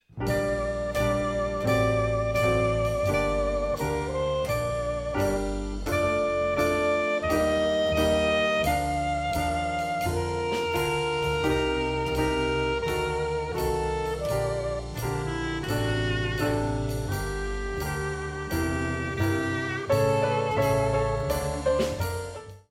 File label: Blues